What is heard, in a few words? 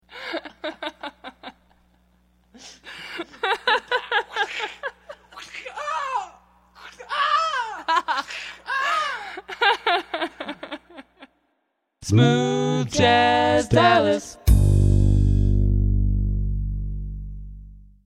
laugh; Smooth Jazz Dallas